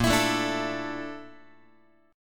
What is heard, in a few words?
A sharp Major Sharp 11th, Guitar; strummed; chordshape; x,6,7,7,6,8